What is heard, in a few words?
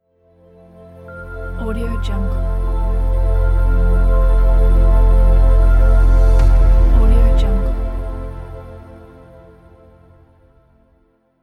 logo